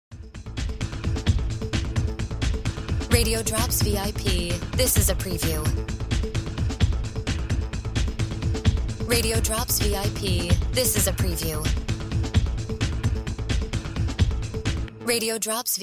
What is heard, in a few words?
No FX Music Bed